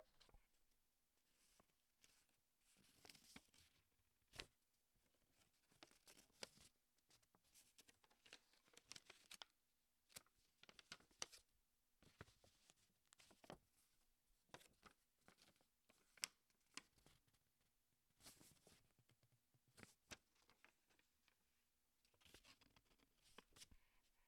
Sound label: Dollar Bill Rustles